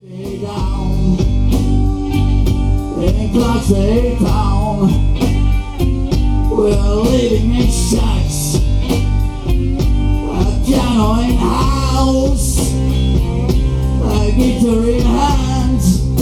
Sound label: Mississippi Blues